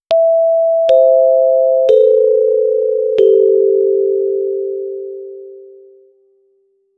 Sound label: school announcement chime; school bell